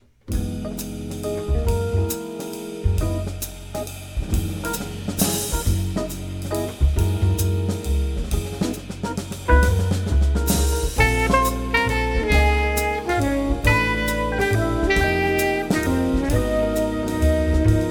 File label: Fishing Waltz